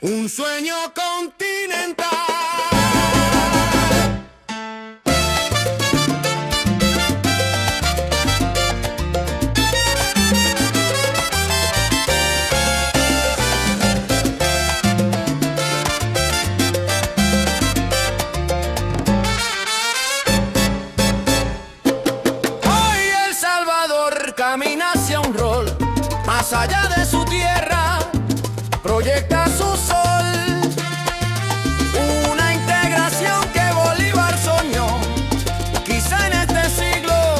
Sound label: SALSA